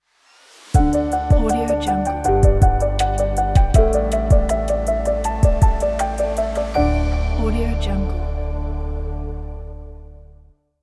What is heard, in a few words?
positive uplifting logo